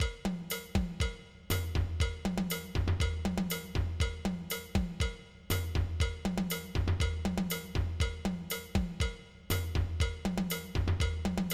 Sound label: chacha